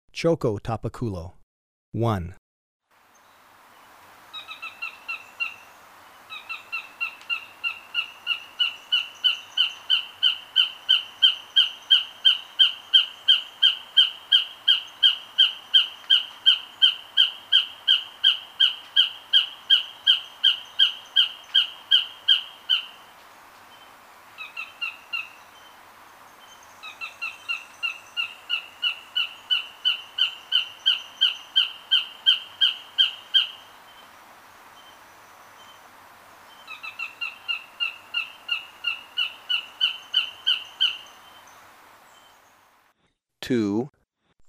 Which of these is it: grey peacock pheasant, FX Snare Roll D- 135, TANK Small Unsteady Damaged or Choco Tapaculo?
Choco Tapaculo